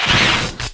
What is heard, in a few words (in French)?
impact instruments